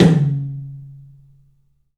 TOM, 3D Percussives

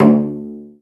Cell Pz D2; Digital Sound Factory Virtuoso

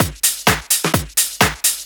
Discohouse Loop 128 BPM

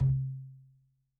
MEDIUM TOM, ELECTRO KIT

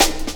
Pride Cut, Drum Loops, 165.5, Jungle; Zero-G Jungle Warfare 1, 2 & 3

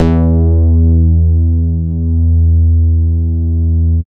Bass Analog & Synth; USB Soundscan vol.12; AKAI

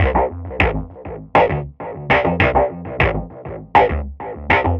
synth 100 hardseq C A D F; Transmission-X

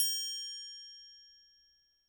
percussion, Roland TD-20

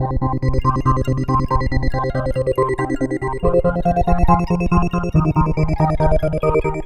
Pulsing; Dr. Fink's Funk Factory

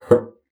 BASSOON; Xsample VOL-6

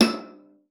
E6, VIOLA FINGER; Xsample VOL-5

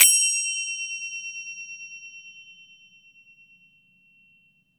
FINGER CYM; AKAI S-Series CD-ROM Sound Library VOL-5

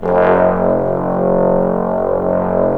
BRS BSTBN, Bs.Bone Sect; Roland LCDP06 Brass Sections; Bs.Trombones

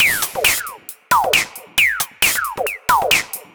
Lazer Face 135BPM, sci-fi Beats

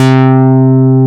DANCE C3, AN BASS; AKAI S-Series CD-ROM Sound Library VOL-7

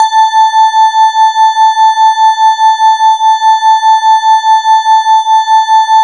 E-HARP, DX HARP; Wizoo - Powered DX

